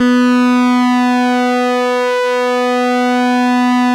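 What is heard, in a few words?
ARP lead B2; Sonic Reality; Vintage Timetraveler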